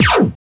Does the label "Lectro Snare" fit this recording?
Yes